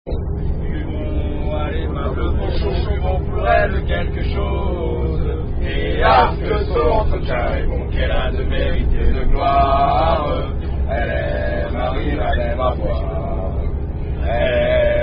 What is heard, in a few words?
Unknown French Rugby Song; Chanosn Paillade Rugby